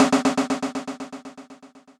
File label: Strike Slip, percussive; Ableton; Glitch and Wash